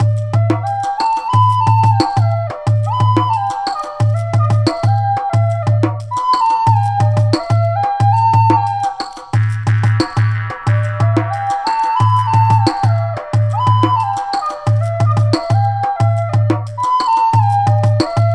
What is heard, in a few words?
Flute Pentatonik